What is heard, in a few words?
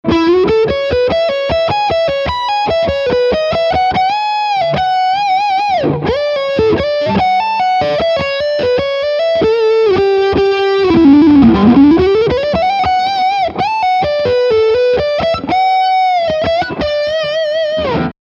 Leadline Medium Gain